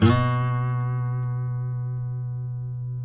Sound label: B, guitars; Spectrasonics; Hans Zimmer Guitars VOL-2; FNGSTYLE PFM